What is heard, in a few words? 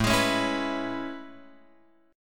A Major 9th; AM9 Chord {5 X 6 6 5 7} strummed